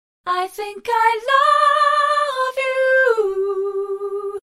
Vocal Doubler Vocals Wet; Chorus; Vox Quantizer; syntheway

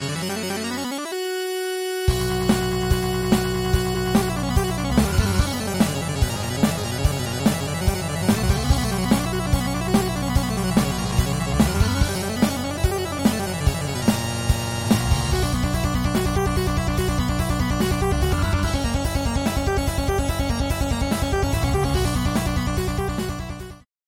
Force Lead; Waverator Spectra

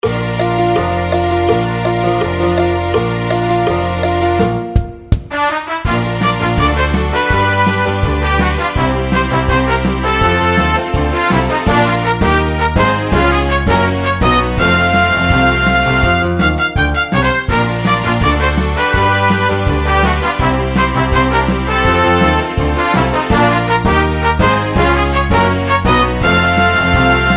Brass Ensemble Trumpet Trombone Mandolin Strings; Syntheway

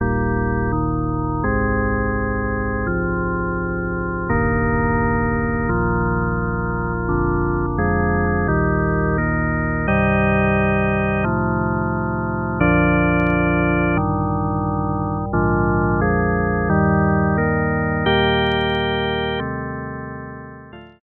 Soft Organ; Harmodion; Syntheway

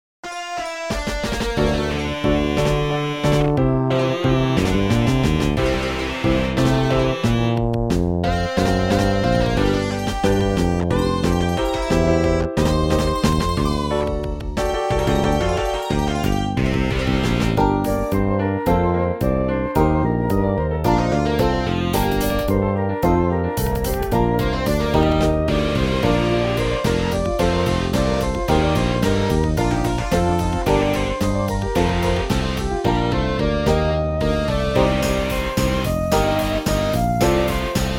Fretless Bass, Flute, Piano; Steinway Strings; Bassesland; DAL Flute; RV Piano; Syntheway

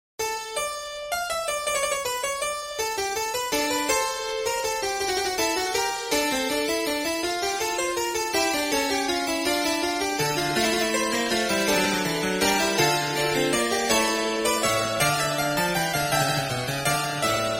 Harpsichord; Gigue du 1er Ton; Pieces de clavecin; Lambert Chaumont; RetroMagix Harpsichord

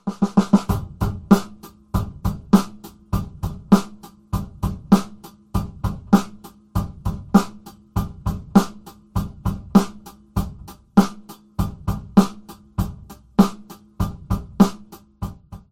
Dual Delay Encapsulated Delay Wet; ClonEchoes; Syntheway

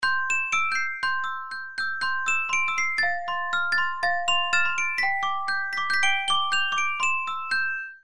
Orchestral Celesta Celeste; Drumwavy; Syntheway

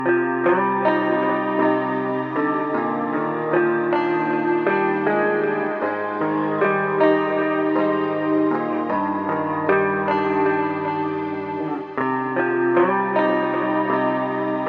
Phaser Twisted Vibes Guitar Dry; Chromacycle; Syntheway

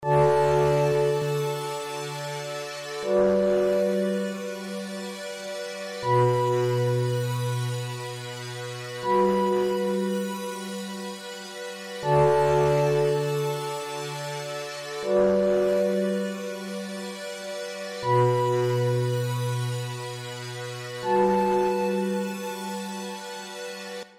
PAD Ultra Deep Planet; Synthelium

